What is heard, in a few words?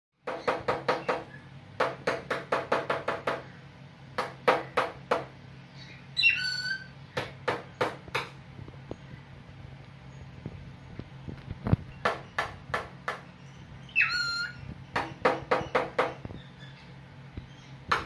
cockatiel Speaking; albino